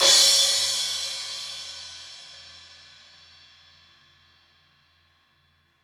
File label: Crash, acoustic kit